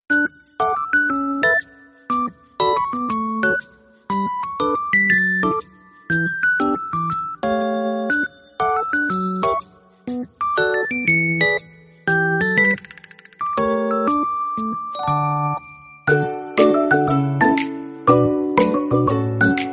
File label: background music